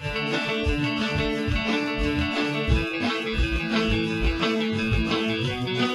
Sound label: Groove